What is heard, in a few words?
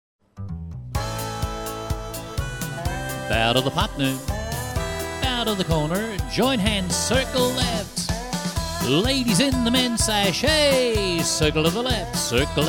hoedown vocal, square dance music